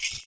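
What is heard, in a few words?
PERC, percussions; Univers Sons - Raricussions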